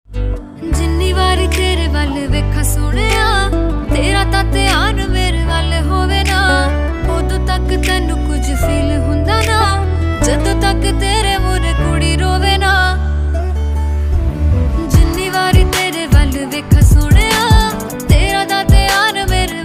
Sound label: Punjabi Beats Ringtone